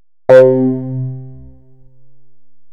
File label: grr